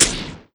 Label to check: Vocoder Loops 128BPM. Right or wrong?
Wrong